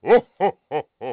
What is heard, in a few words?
Christmas Santa Laugh; christms